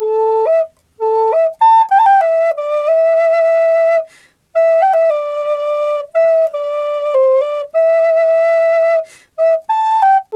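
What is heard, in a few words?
flute, 93 BPM; Big Fish Audio - Didgeridoo and Other Primitive Instruments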